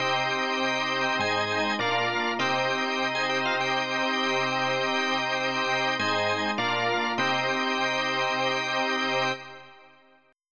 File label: DEFEAT; That - For Windows 95